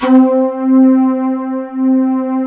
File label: CHIFF STRGS